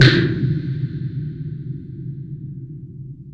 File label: snap; Optical Media International; Sonic Images Library; SI FX Vol 4